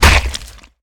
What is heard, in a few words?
hit